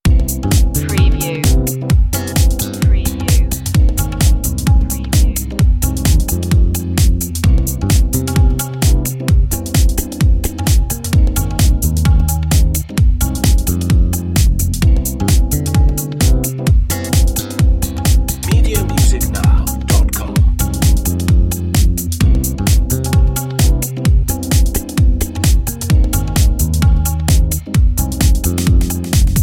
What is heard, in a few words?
ethnic night life